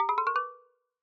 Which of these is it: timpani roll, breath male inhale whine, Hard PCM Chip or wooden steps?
wooden steps